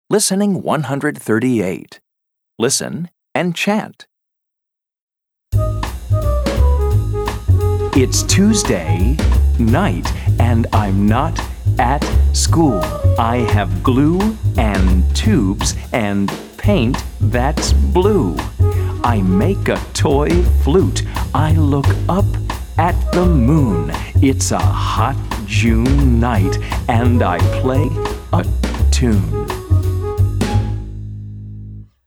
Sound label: Chant; Family And Friends; Family 3